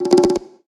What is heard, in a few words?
lantern